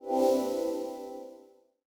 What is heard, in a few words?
ui email sent; Nokia Asha-500